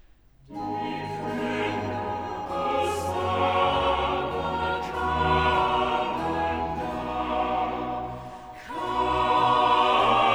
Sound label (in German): Choral; Epiphany; Cantata, BWV 65 'Sie werden aus Saba alle kommen'; II. Choral 'Die KOn'ge aus Saba kamen dar'; Bach; Weimar; Leipzig